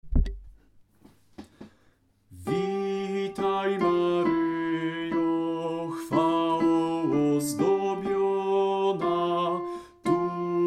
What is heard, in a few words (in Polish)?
sopran; Witaj maryjo